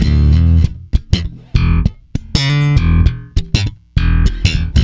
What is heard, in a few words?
E, bass; Spectrasonics; Bass Legends